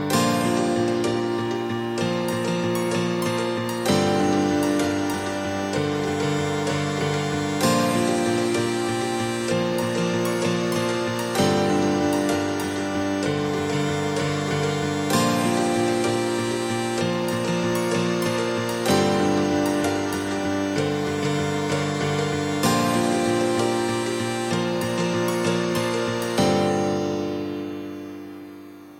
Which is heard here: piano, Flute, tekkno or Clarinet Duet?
piano